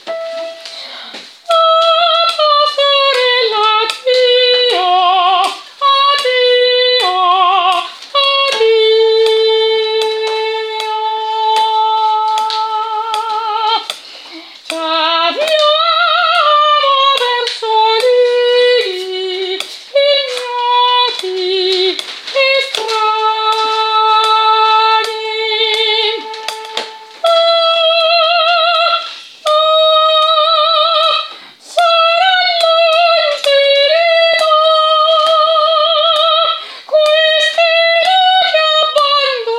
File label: soprano pastorelle